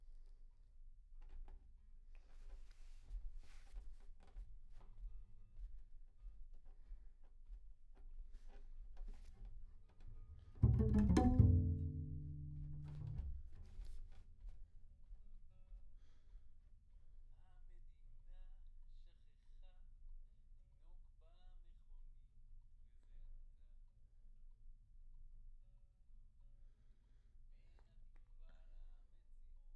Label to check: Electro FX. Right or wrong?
Wrong